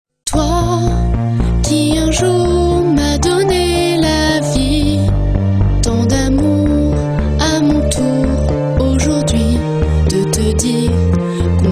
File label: french song